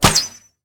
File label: crossbow shoot; item; Minecraft; Votive World